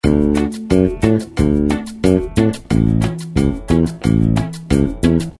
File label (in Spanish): Patron bajo cumbia; how to play cumbia bass